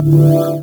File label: Chords Tones; Equipped Music; Loungin House